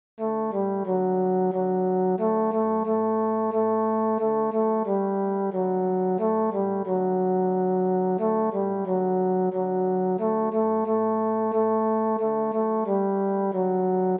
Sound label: tenor